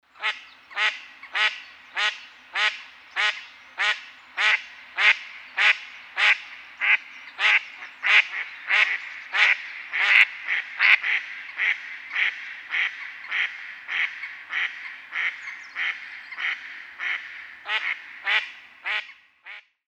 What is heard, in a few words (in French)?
Canard colvert, oiseaux; audio les oiseaux